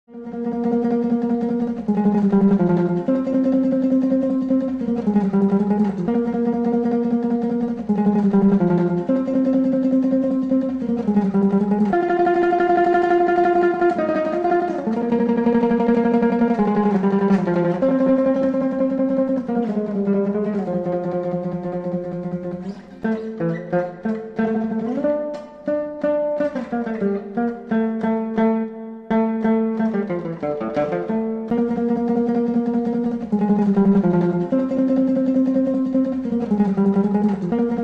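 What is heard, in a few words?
Old Russian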